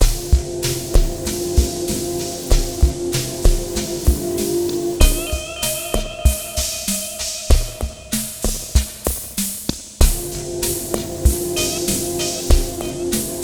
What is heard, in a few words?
Acid Mod Full-F, lounge, 096; Zero-G Kocktail Kollection; Construction Kitz